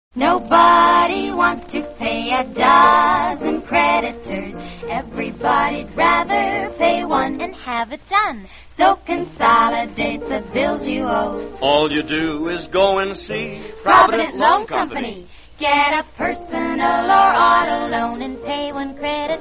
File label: radio jingles; provident loan; allied radio artists